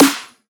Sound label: snare